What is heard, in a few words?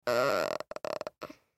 Human Moan; Moan Male Six Yrs Boy; Pain; Blastwave